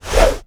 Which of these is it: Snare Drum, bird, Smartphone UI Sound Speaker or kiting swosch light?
kiting swosch light